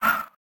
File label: throw; shweets sim; braixen